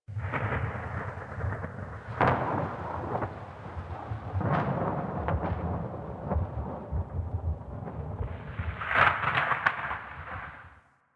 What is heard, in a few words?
Venus Landscape Distant Avalanche; Ambience; Warframe; Venus Open World